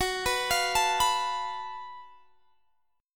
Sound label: Gb Minor Major 7th Double Flat 5th, Guitar, Standard, chord; arpeggiated; x,21,21,22,22,19; JGuitar